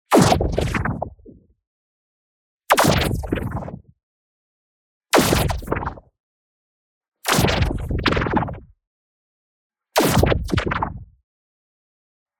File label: IMPACT MEDIUM Wobbly Chunk; boom library; Matter